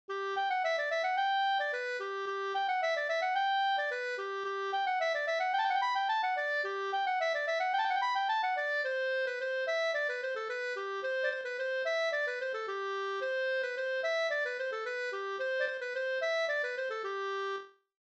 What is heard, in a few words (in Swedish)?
halling